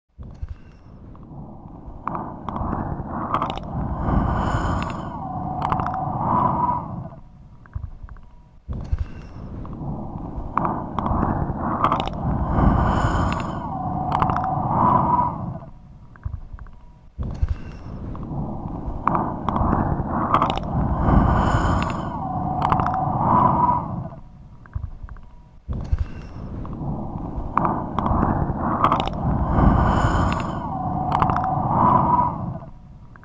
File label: noise loop